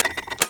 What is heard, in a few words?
bomb arm; snds jack gmod